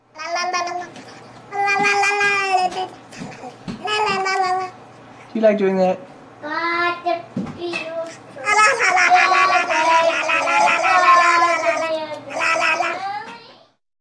noises, makes sounds when Betsi runs her fingers over her lips